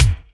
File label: louse, Kicks Medium